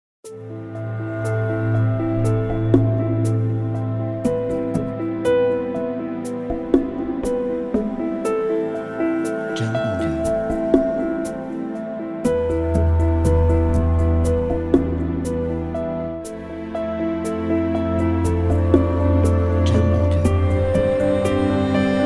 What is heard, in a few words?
Travel Inspiring